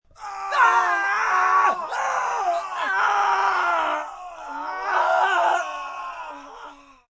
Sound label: Group Scream Men, Screaming, group of men screaming (3-6 males only) (human voice); VoiceBox